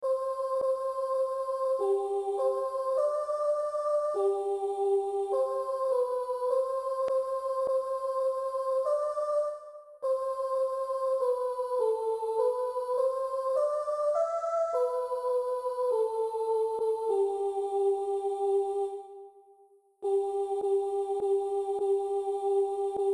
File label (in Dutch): sopraan midi; christmas; adeste fideles